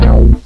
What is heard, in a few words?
synthetic chord; Sound Effects for Multimedia - Volume 1; electronic sounds